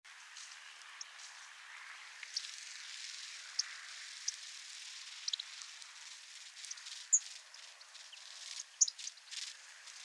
Carduelis carduelis; SETE

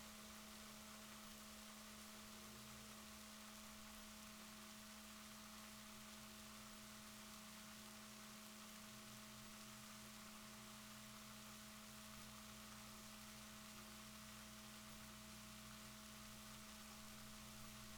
rorquals positif, Balaenoptera Physalus Chile

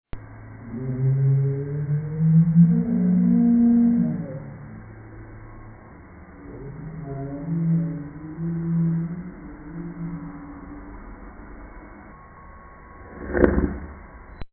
Atl Right Whale, Voices in the sea

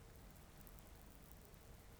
pulses, BALAENOPTERA; MONACO 2022-07

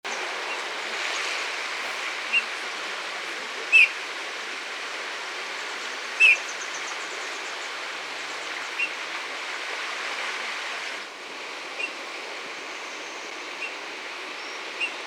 Pyrrhula pyrrhula; SETE

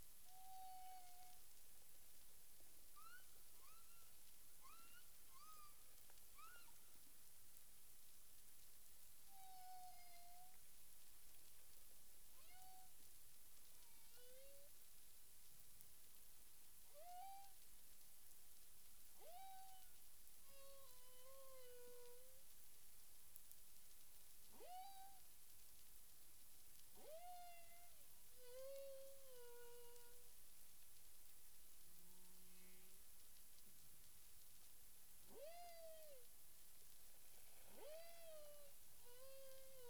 humpback whale; CARIMAM

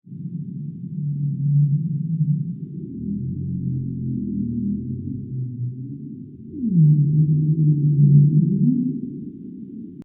Bowhead, Voices in the sea

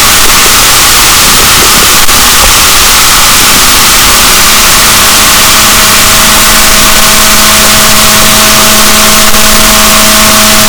rorquals negatif, boat

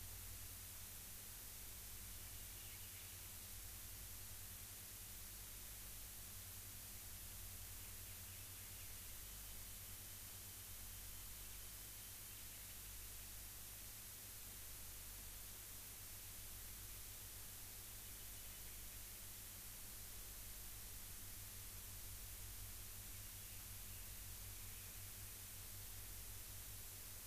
rayol farm; SEAMED

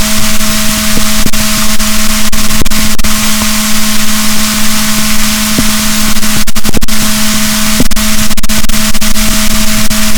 rorquals negatif, boat

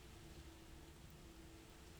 pulses, BALAENOPTERA; MONACO 2022-07

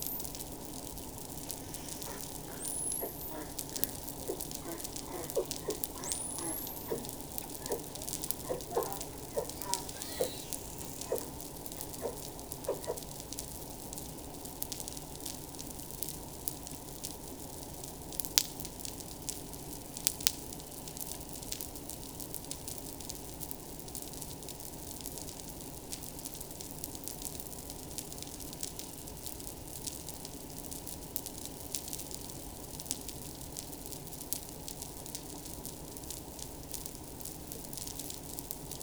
RISSO

